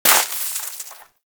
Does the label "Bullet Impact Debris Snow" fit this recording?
Yes